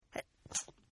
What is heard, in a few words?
Sneeze Female Short; Sneezing; Human